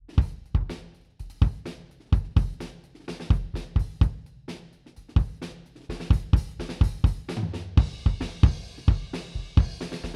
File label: kick distance blanket D112